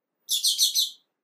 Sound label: loud four